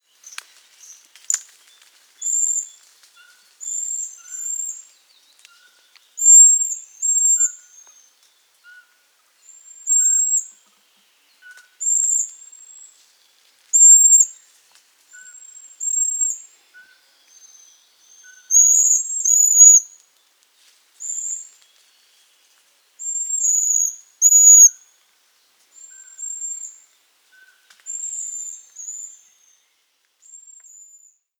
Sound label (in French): chevechette d'europe glaucidium passerinum